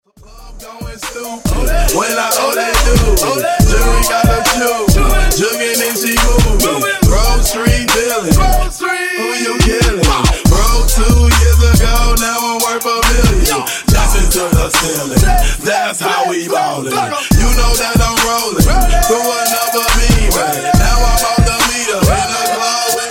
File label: Funk Word Play 70 97Bpm